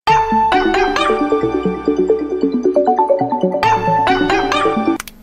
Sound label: HUAWEI; Gabe the dog